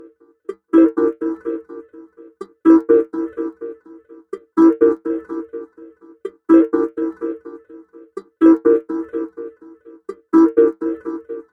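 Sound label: delayed chill summer guitar A minor; instruments; loops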